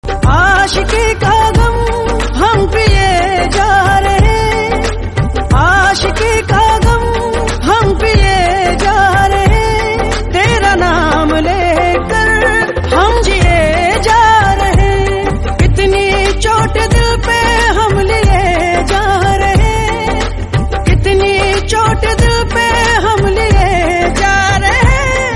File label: ringtone